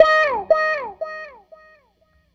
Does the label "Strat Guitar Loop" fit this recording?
Yes